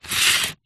sport plastic sports cone short scrape on concrete; zapsplat